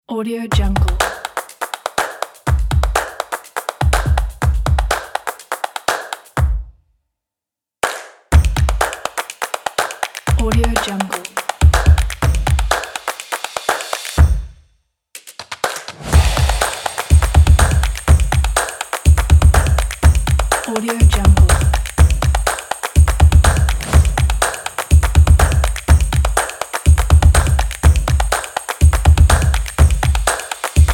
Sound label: drum on beat